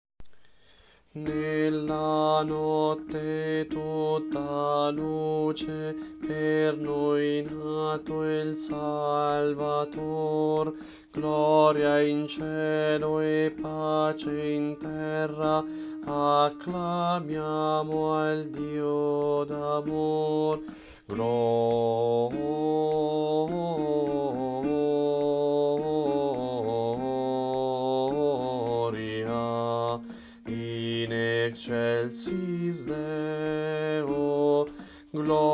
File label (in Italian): contralti; Coro Isola del Giglio